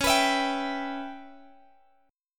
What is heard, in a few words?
C sharp Minor 7th Flat 5th C sharp Guitar Open G